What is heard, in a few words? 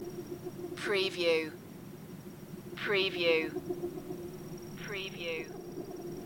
jurassic atmos loop short